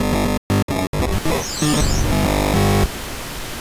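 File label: ui glitch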